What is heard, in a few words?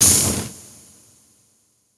Equip Fire Eggs